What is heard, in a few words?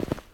Footstep Rock